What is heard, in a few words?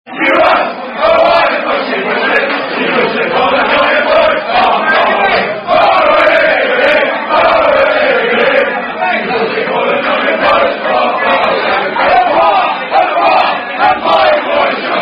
rugby social, rugby song; Far Away; Far Far Away Unknown Rugby Song